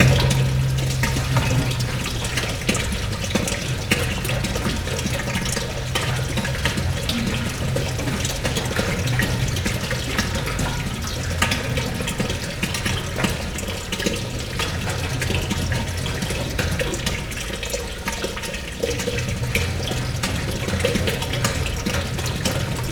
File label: symphonic poem